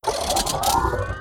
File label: notification repair fleet; Stellaris